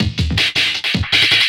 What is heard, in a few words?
Loop, Drum & Bass, 160 BPM